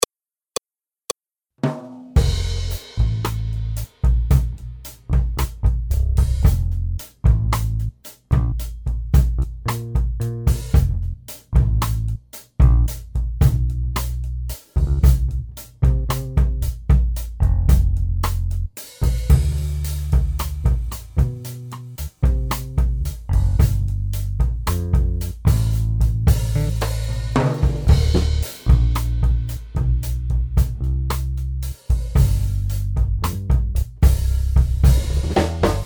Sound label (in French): Accompagnement seul